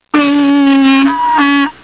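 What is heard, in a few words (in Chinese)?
lv jiaosheng, donkey braying; jiachu jiaosheng; jiaqing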